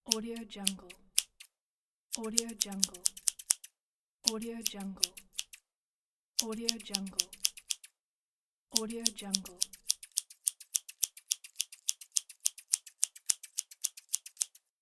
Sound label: the cutting scissors